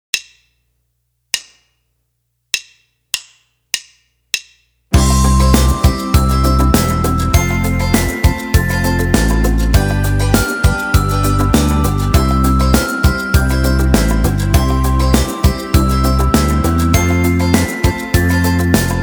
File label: glockenspiel